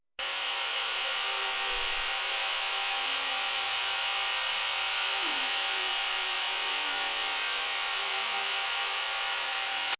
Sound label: Bees